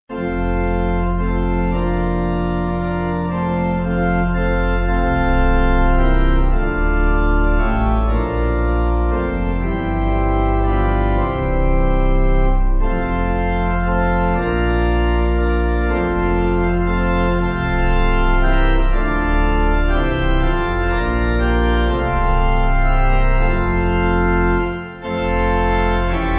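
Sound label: Pipe Organ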